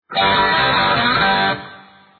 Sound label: riff